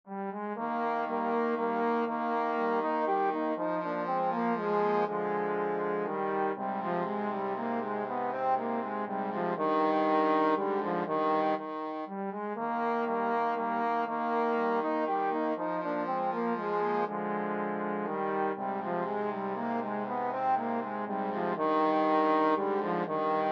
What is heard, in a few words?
haydn minuet trombone